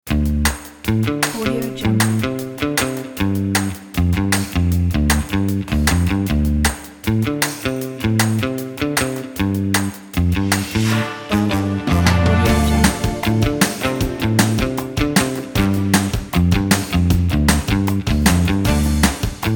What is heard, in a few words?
funk jazz upbeat kit